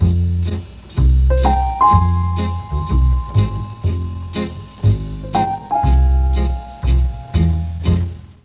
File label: G#1, Mellotron; Mike Pinder - Mellotron Archive CD